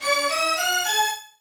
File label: Beat